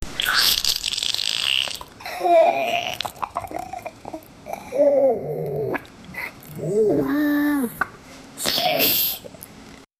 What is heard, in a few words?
bloated queasy overweight, gross